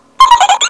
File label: minnie giggle